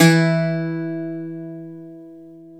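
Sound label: BZ F E, bouzouki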